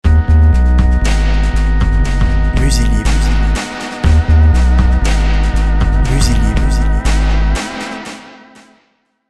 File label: dub, jingles